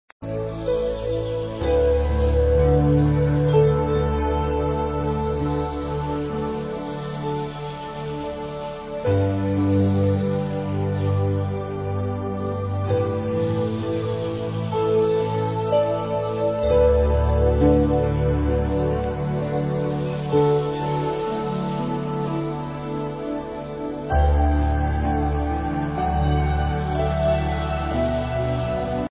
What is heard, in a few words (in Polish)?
muzyczny relaks